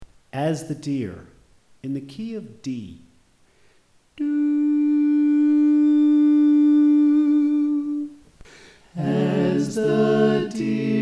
Bass Left

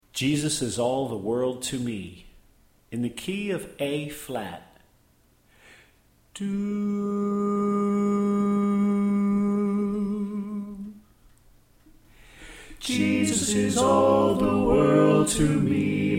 Soprano Left

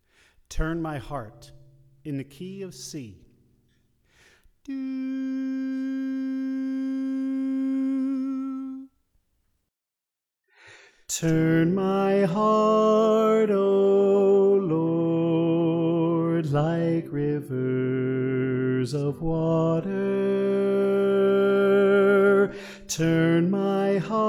Soprano

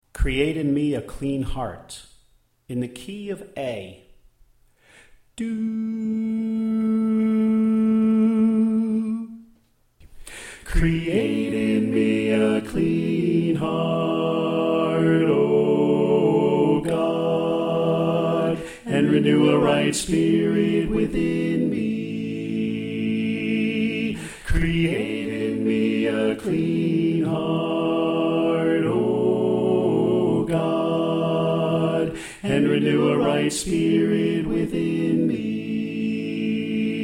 Tenor Left

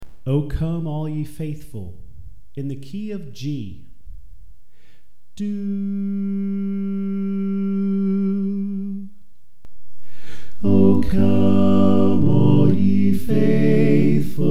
Equal Mix

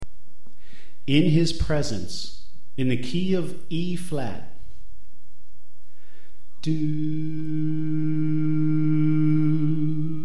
Soprano Left

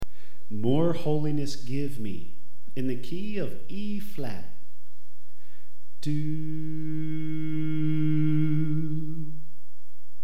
Bass

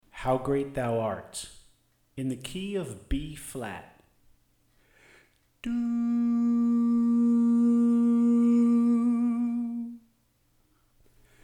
Tenor

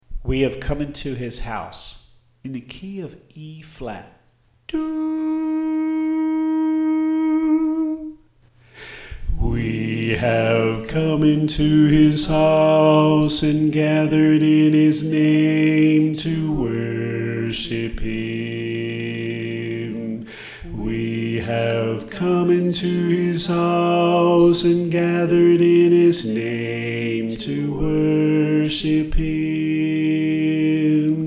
Bass

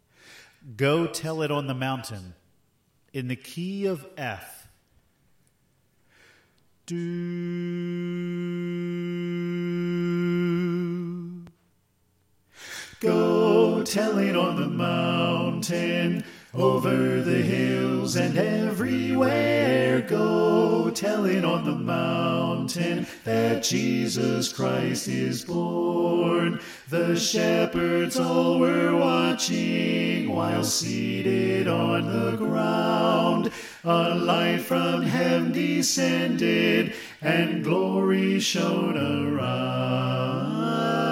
Soprano Left

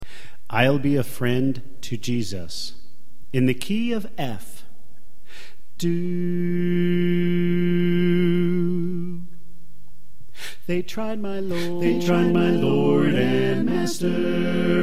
Bass Left

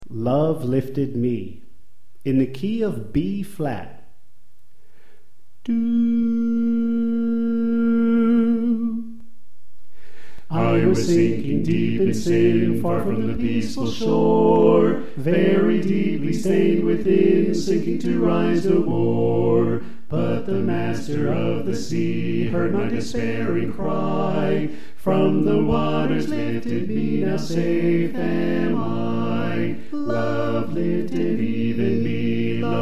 Tenor Left

